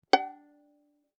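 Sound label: Violin pizzicato fortissimo on A string Gb5 stereo; MIS Pitches - 2014; Strings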